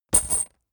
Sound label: metal chain impact